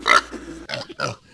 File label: Pig Grunt; Civ4 beyond sword; Resouces and Improvements